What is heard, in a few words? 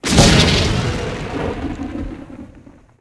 explo; impacts explos